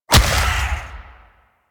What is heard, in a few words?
Rupture Impact B; Warframe; Warframes